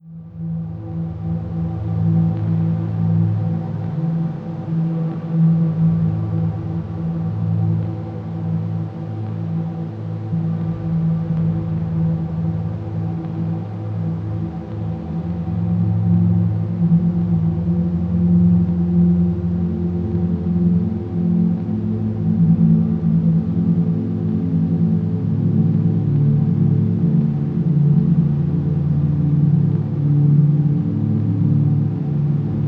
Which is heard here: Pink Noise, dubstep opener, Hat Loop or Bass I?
Pink Noise